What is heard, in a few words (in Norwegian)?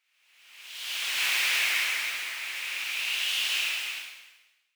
Vind